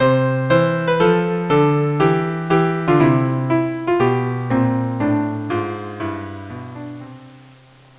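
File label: violin cello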